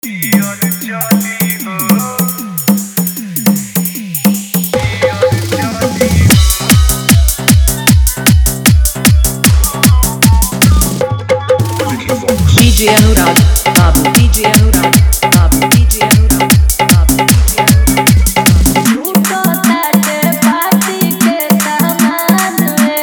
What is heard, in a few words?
New Bhojpuri Dj Song Fully Dhollki Bass Mix; Happy New Year 2026